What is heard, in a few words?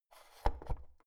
oven knob press out soft